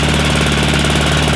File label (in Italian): Veicolo, motori